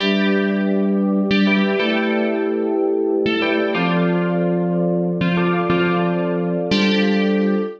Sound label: Keys C Major 123 bpm; Accompaniment; Tonal; MIDI Clips; Impaled Keys; Drive and Glow; Ableton; Factory Packs